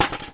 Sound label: Objects, Household, Metal Bucket, Old, Rusty, Set Down On Concrete; Metal Bucket, Old, Rusty, Set Down On Concrete 03